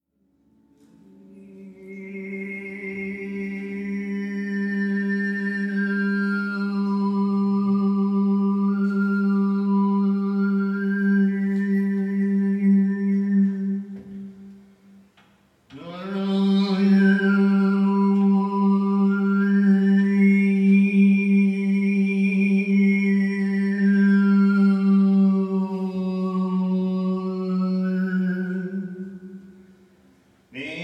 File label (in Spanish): canto armonico; Gli armonici vocali; Dal concerto "Universo in Ascolto"